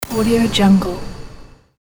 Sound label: scifi laser beam